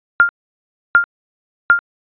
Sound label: ecg